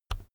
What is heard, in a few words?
keyboard external key push soft